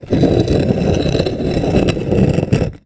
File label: foley large rock movement; zapsplat